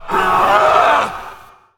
Majestic Stag Attack

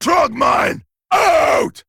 Male Trogg Attack

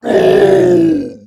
Free Spirit Death

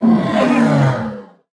Sabretusk Death

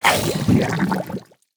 Gluttonous Ooze Play

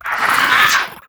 Scorpid

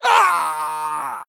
Male Dwarf Death

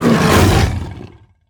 Sabretusk Attack

